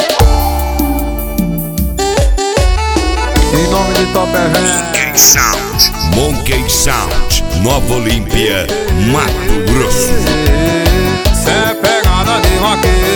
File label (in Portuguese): esp balada